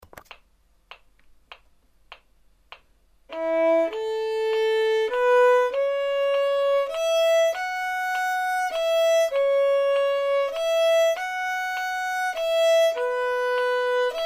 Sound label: vln prac; Building Technique with Beautiful Music, Book 1 Violin; applebaum